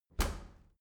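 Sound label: dry cleaner open hard, maschinen türen; tueren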